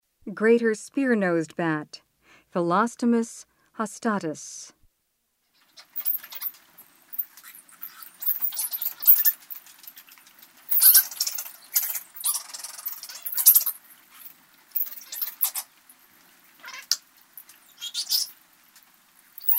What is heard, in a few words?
Greater spear-nosed bat, Mammals of the Rainforest; S. America